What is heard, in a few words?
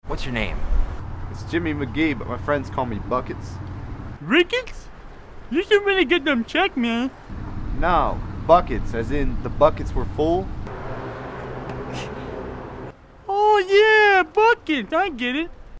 traffic